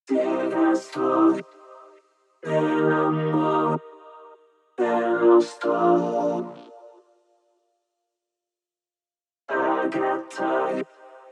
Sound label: vocoder solo mix; Bitwig Studioにヴォコーダープラグインが追加（続き）